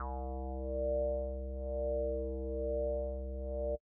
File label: Synth Bass C1, 120bpm, House; Construction Kits; Big Fish Audio; Old Skool House; OSH House of Amnesia